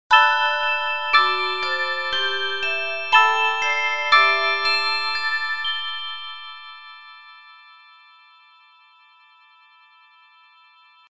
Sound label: chime short